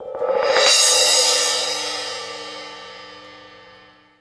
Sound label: Push Cym; Sonic Foundry (Sony Creative Software); World Pop; Percussion; Cymbals